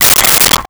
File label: Saucers Stacking, Kitchen